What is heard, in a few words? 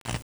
spark